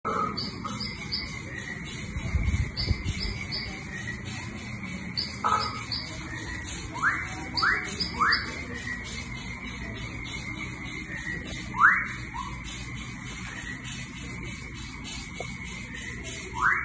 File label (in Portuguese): Sons da instalacao Quatro cantos da Mostra Seguir os sapos